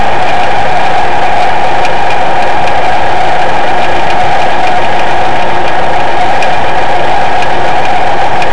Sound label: after drive, fiat barchetta